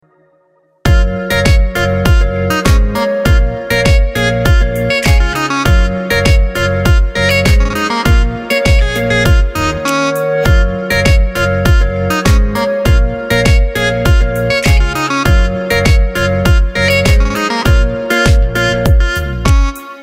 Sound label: instrumental